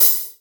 hihat rock short

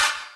soft hit clap

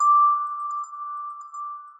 mid chime

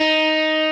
Arp. x 4

